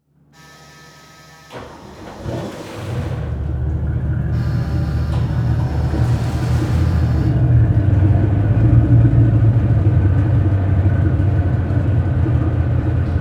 Transportation Patrol Boat Start Idle Off At Dock From Rear